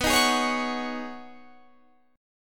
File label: B 6th Add 9th B down Guitar Drop D